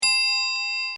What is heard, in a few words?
Clock Mantle, Half Hour; Clocks (Sound Effects:Machines)